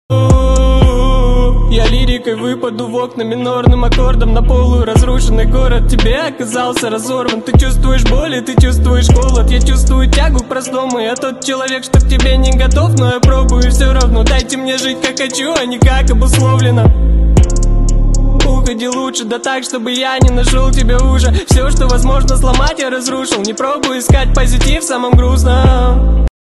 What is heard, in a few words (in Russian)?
Minornyj akkord